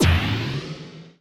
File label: sfx sci fi; Masterbits - Switch FX